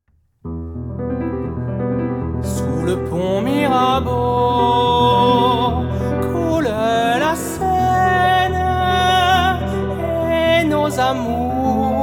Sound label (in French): chant piano; Alcools